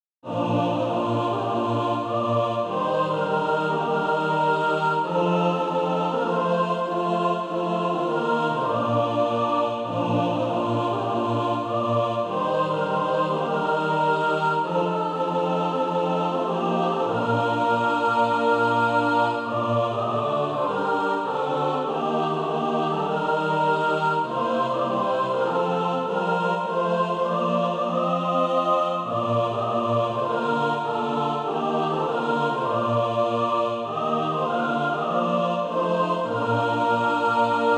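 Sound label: mixed, Practise Music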